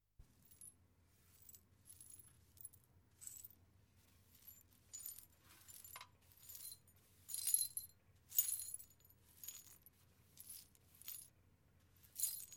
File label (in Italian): VETRI SPAZZATI SCOPARE